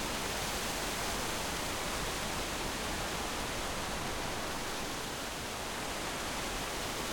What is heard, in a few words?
Ambiance; Knytt